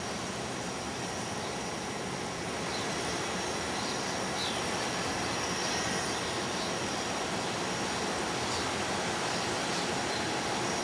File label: robin